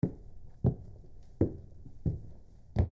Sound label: MedicalScientific; Soundfx III